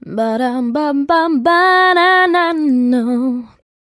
voice bad da dum dum ba dana no; Phonky

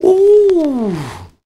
"ooh" sung; Voice

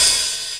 Real Open, Hats

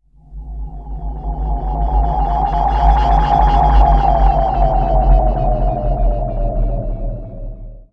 air, rumbles

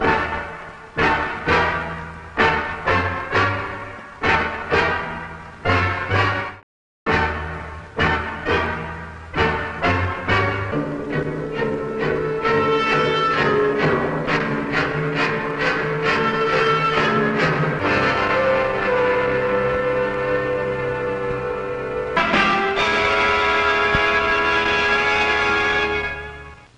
strings, Sad Loops

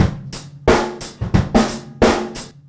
Drum loops Boombap

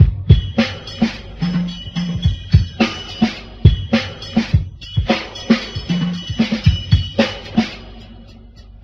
start and stop, Funky Drum loops

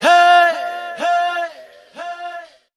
hey SUNG; Voice; ooh ahh

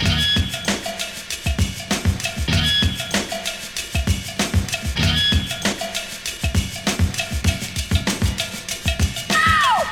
beep ow, Drum loops, Funky

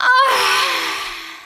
female orgasm

